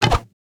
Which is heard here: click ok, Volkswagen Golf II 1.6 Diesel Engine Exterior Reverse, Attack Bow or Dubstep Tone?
Attack Bow